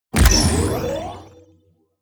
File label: sci-fi click positive heavy; hits impacts